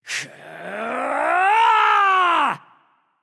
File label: battle cry; smite; loki